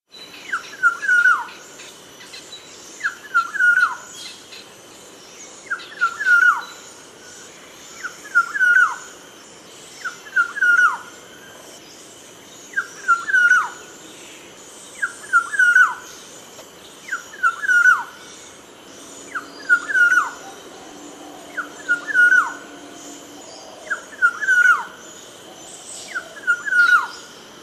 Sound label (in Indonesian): kepodang